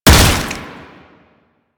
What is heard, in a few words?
Underground Attack Tentacle Slam; Enemies; Abilities; Warframe; Proto Infested; Babau